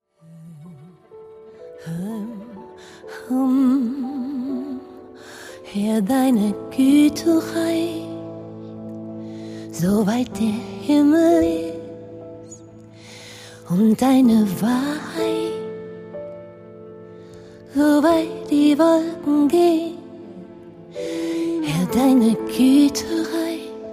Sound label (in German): Improvisation